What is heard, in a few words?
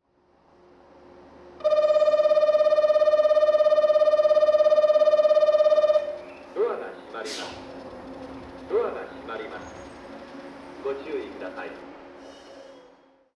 bell, osakaloop; fukushima; jrwest